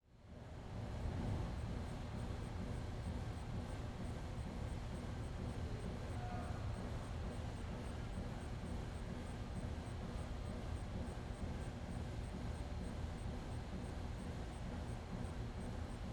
Ambience Factory Exterior Between Two Buildings 180; AdobeCC